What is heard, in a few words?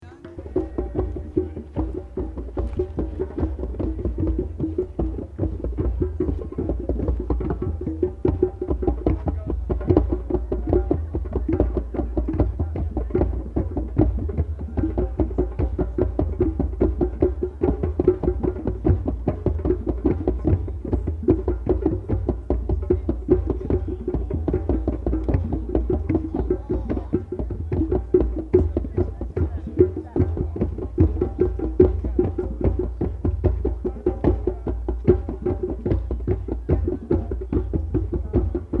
Drums; Utah 2003; Rainbow Music